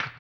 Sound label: HAMBONE, Vocal Blues Soul; Spectrasonics; Planet